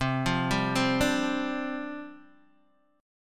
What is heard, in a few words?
C Suspended 2nd Suspended 4th, down, Guitar, DADGAD